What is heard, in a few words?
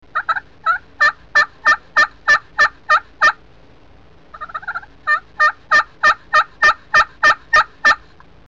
Turkey Yelp